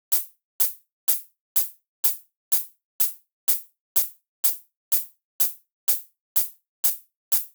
hh groupes

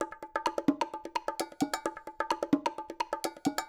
Loop Percussion 130; drums pads

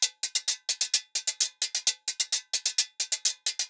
Loop Guira 130

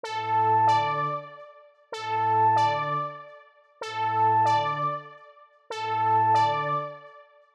group BRASS

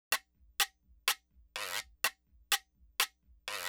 Loop Guiro 125

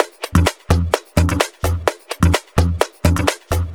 Percussive Loops

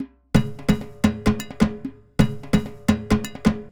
Loop Percussion 130

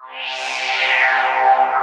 long noise; drums pads; noises

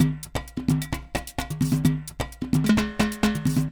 Loop Percussion 130

